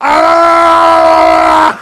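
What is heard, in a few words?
heavy pain critical death; voice packs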